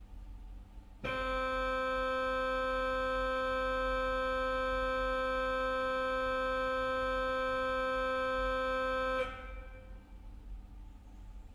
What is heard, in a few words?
musette; Orgue; Ce petit orgue